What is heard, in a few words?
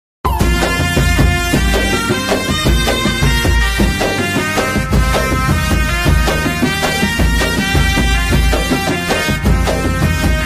folk bgm ringtone